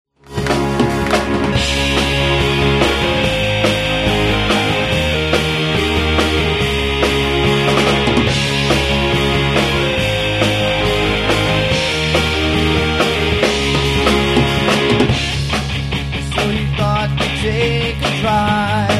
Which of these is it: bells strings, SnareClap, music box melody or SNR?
bells strings